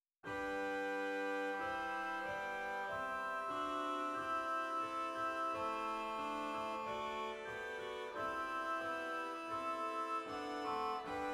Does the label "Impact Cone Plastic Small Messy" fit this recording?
No